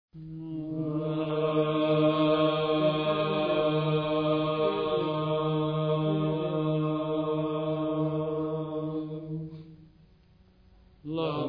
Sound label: Choir; Epeskepsato Mode03; MontrealPsaltiki